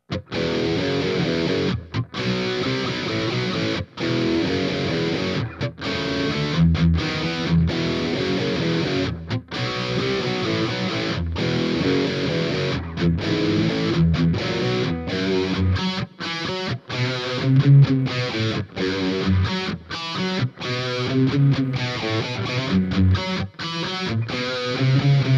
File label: Heavy; Szekeres